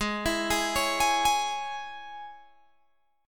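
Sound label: Ab Suspended 4th Sharp 5th chord, Guitar, Open G tuning; 18,21,18,18,21,18; down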